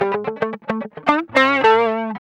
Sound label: GUI, LICKS; 110 LICKS